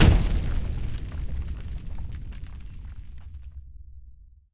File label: Strike Ground